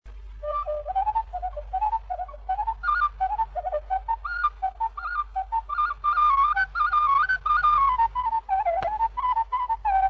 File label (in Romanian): Joc de talie la fluier; jocuri si cantece batranesti; Vaslui